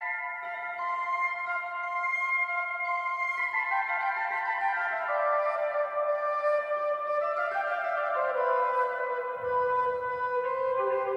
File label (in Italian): organo portativo